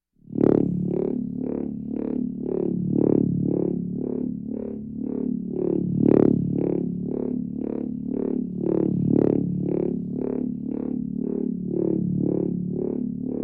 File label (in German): Kosmische Klaenge Kosmische Qualle